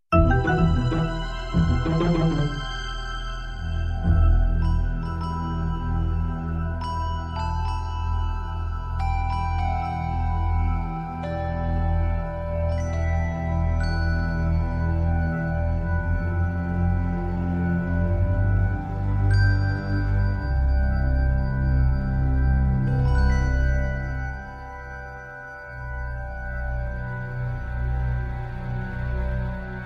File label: Ho Ho Ho